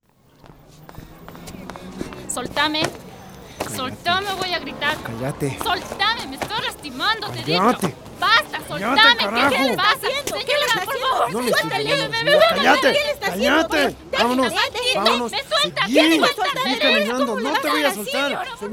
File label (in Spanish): el grito; trapitos al sol vi